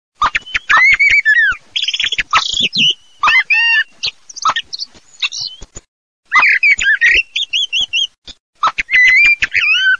MERLO CHIO PIP TORDO CHIO, birdsound

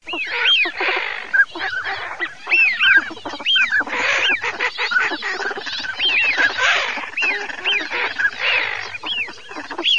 fischione codone, birdsound

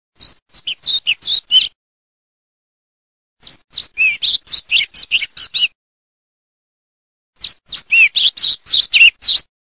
BAIANERA

